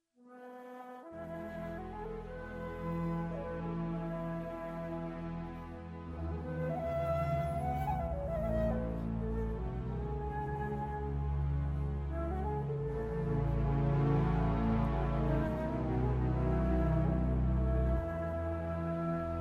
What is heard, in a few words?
flute keyboard; darkwoman